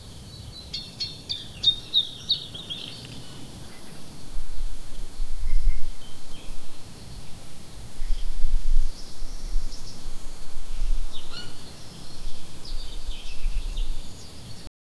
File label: big bird flying noise of